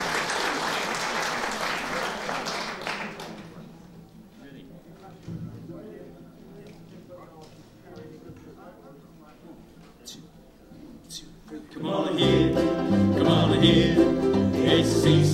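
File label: strummers band, ukulele; seaside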